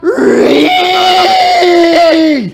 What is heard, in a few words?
REEEEEeeee